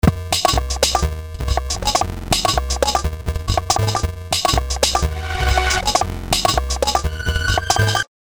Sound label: glitchy